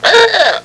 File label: OLD CAR; trasporti; auto